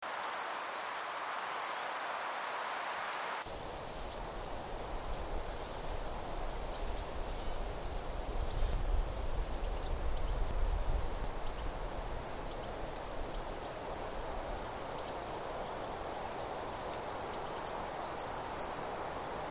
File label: Short-toed Treecreeper